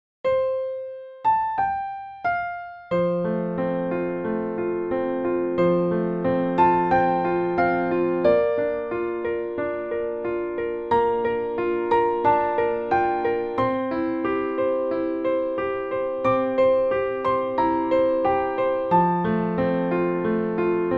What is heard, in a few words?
Jingle bells piano song